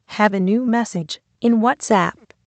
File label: whatsapp message